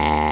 SWORD HIT

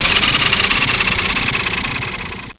explode; WORLD

